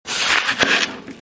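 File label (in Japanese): suika, watermelon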